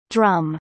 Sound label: drum